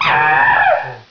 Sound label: headcrab alert; svencoop